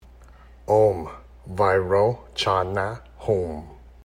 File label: Vairocana Buddha Mantra